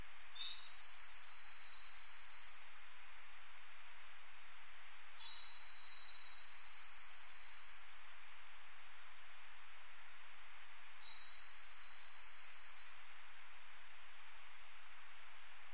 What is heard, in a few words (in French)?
Grand rhinolophe; MNHN